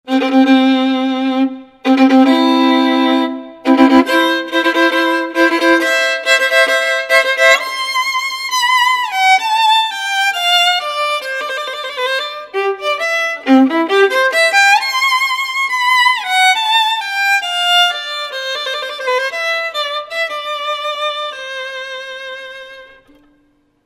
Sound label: Solo Violinist Wedding march